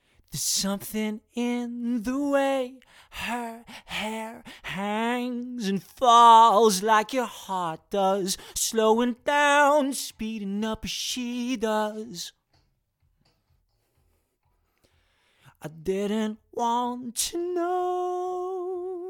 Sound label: Gloria Vocal